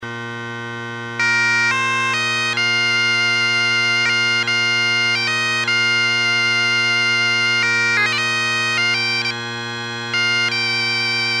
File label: redpipe, electronic bagpipes; electronic bagpipes redpipe classic